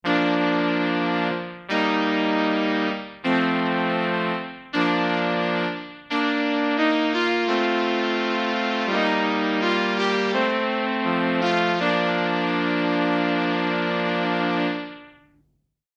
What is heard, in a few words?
Fanfare; ChristmasTunes